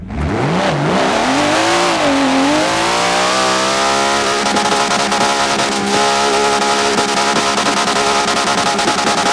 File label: reverse, nissan skyline gtr r34; cyn-cars